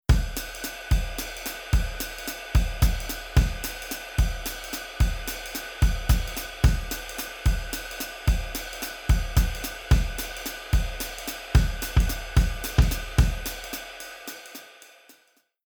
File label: Jazz Waltz Rhythm Kick